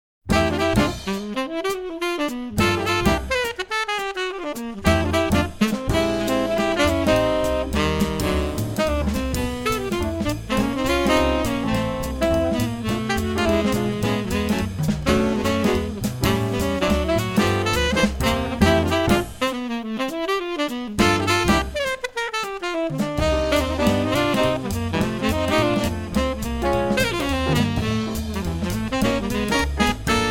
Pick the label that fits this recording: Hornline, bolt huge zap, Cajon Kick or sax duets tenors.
sax duets tenors